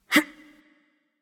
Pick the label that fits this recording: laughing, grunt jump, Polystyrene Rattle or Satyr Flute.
grunt jump